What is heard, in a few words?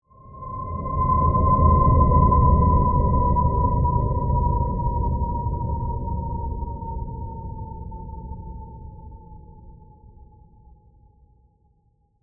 Ethereal Crystal, Swell, Airy, Eerie; Airy Sound Effects